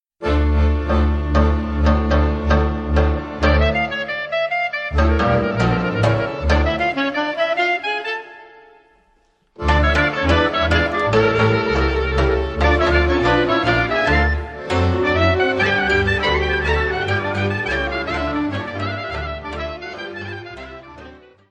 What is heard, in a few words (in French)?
Suita orchestrala din Tara Transilvaniei - Roumanie